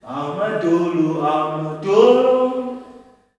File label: "AMA-DOLO", MALE; Spectrasonics; Heart of Africa 2; S.MALEAMB